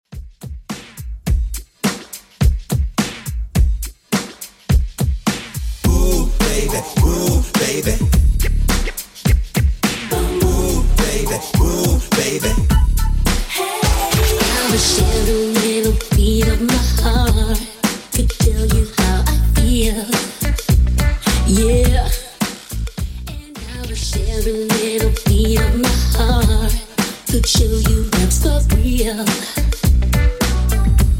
90s Redrum 105bpm

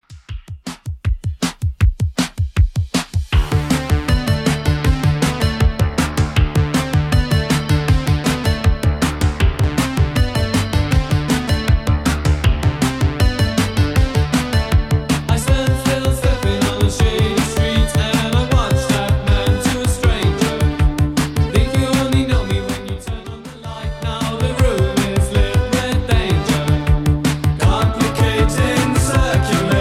80s Redrum 158bpm

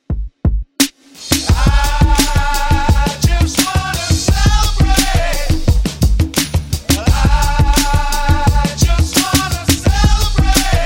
70s Beat n Grind Redrum

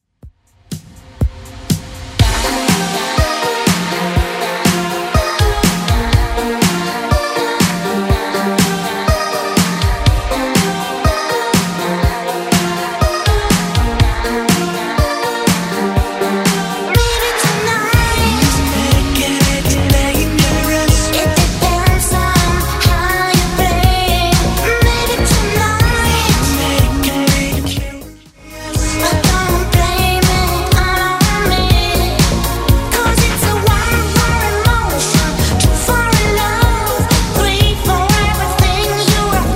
80s 122bpm; Redrum

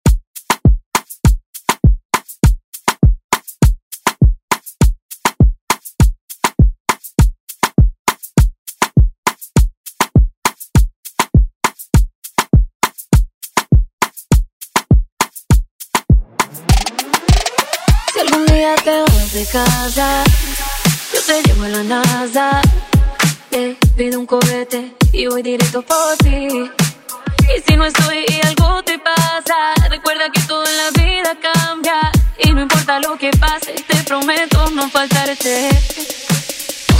Latin Pop Redrum

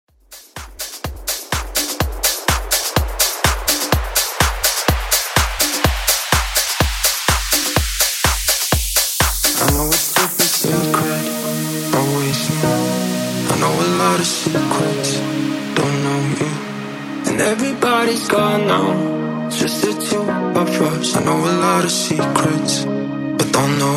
Club Extended Mix 125Bpm Clean

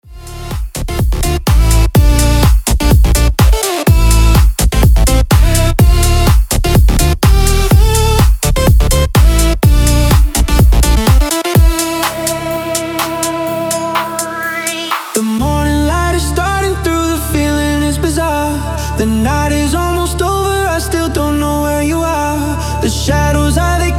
remix 125 bpm

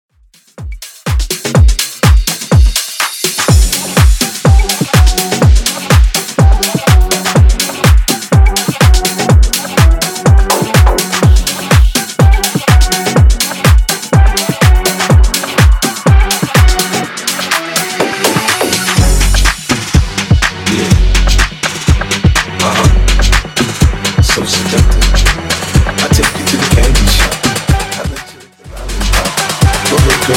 Bootleg 124 bpm; Reworkz

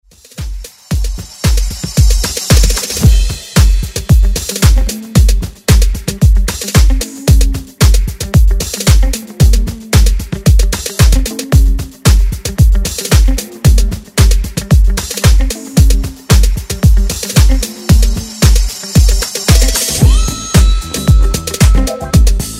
70s Ext Redrum

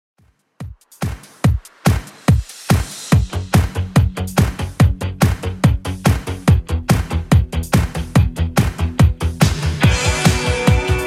80s Redrum 143 bpm Clean

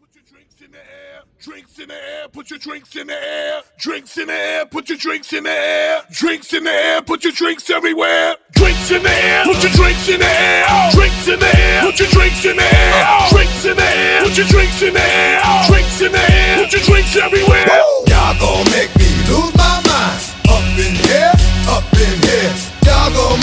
Acapella Intro Hype

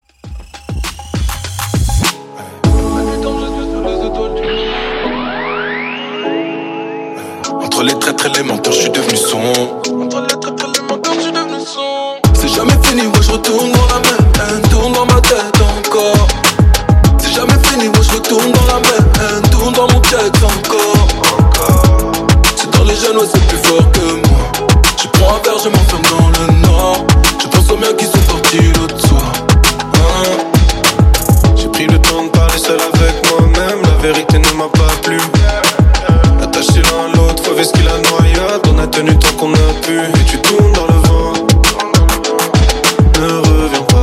Top40 Redrum 100

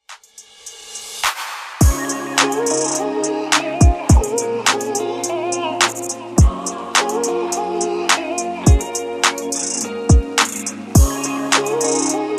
redrum breaker, 105 bpm

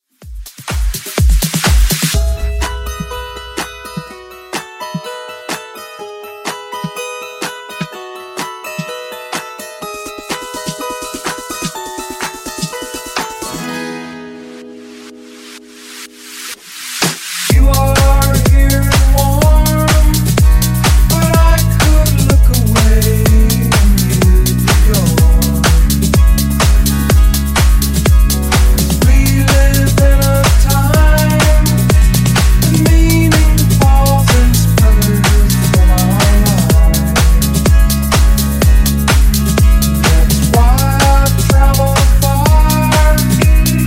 Funk Bootleg 125Bpm

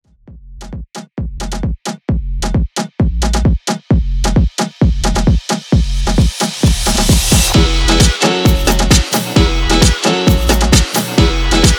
90s dance redrum 132 bpm

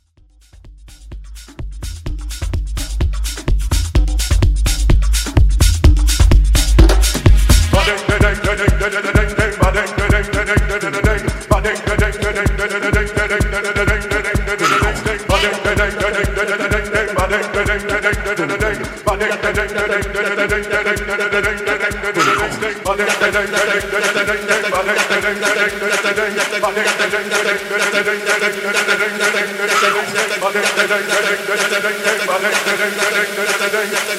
Tribal Blend

